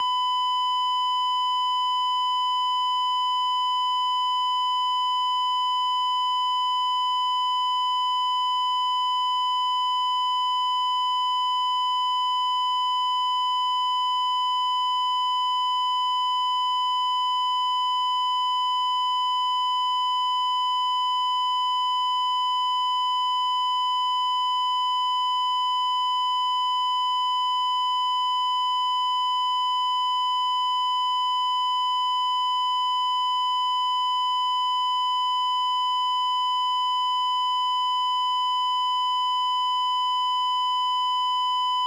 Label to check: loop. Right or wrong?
Wrong